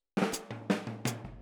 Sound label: Boogaloo Hi Hat, 82 BPM; Sonic Foundry (Sony Creative Software); Drums from the Big Room The Mixes